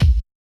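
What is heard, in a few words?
Bass Drum HipHop; Yamaha DJX-2B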